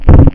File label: Floor